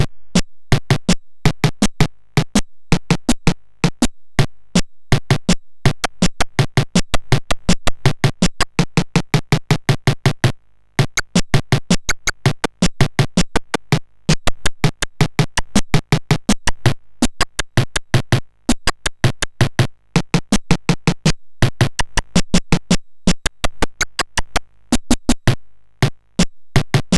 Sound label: Digidrum Concert, MOS6581R4